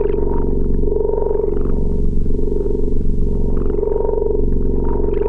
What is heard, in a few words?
attack tangle bomb